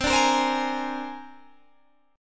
C 7th Suspended 2nd Sharp 5th, C, down, Guitar, Standard; strummed; chord; C7sus2#5